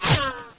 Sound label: whizz; source engine; dod